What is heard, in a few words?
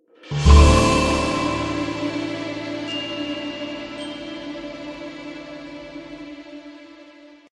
Fanfare